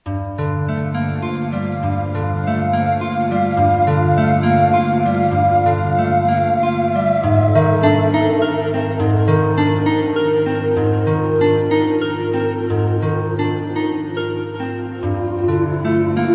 save room theme; resident evil

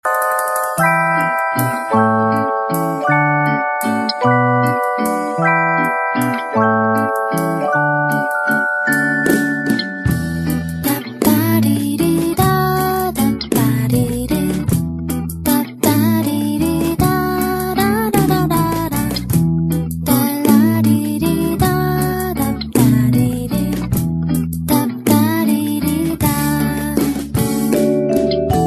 signal waltz

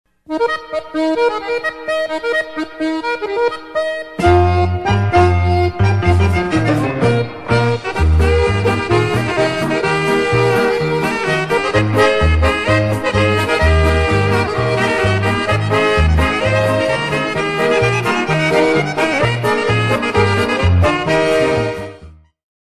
good ringtone

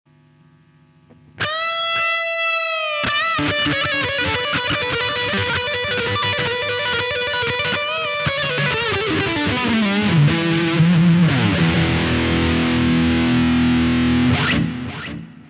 rock guitar solo

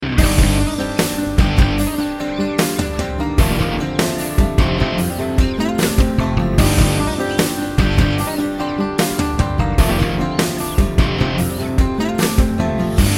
guitar; spurs

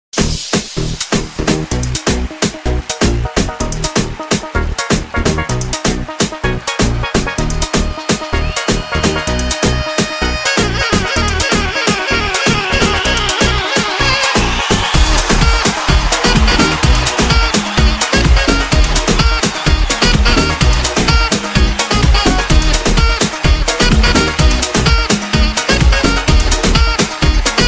saxo dance

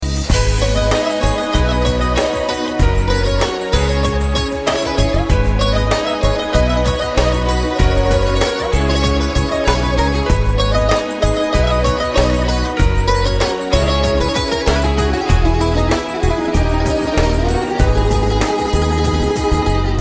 guitar or buzuki

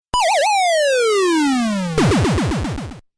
punch out fall

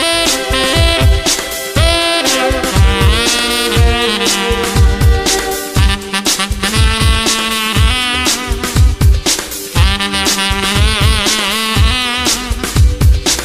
love saxophone

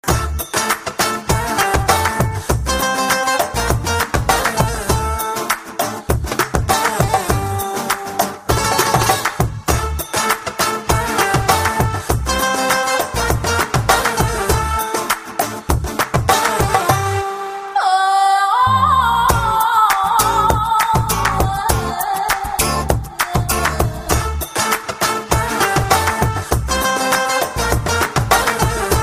arabic style

